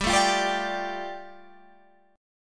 G 7th Suspended 2nd, Guitar Standard; strummed; JGuitar

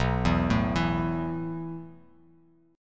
B 7th Suspended 4th B down; B7sus4; arpeggiated; JGuitar

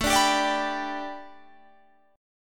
A 7th Suspended 2nd Suspended 4th Guitar Standard; strummed; capo=7

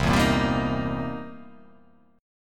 C 7th Sharp 9th, C, down; strummed; chord; JGuitar

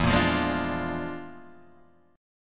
E 7th Flat 5th Flat 9th; JGuitar

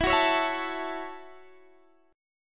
Gb Minor 7th

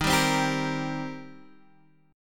D sharp 6th, D sharp, down, Guitar, Drop D

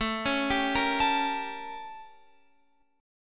A 7th Flat 9th Guitar Open F; A7b9; arpeggiated

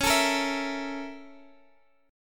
A sharp Minor Major 7th Flat 5th C sharp

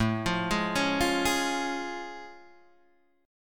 A Augmented Major 7th A Guitar DADGAD

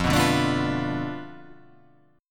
F 6th Flat 5th F down, chord

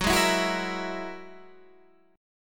G sharp 7th Suspended 2nd Sharp 5th; 14,11,14,11,11,12; chord shape

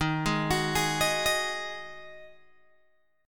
Eb Minor Double Flat 5th Eb Guitar Open G

